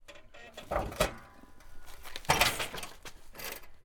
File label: BSA M20 Rough Handling; 1940; CMC6XT; MK41; MK8; Pole Position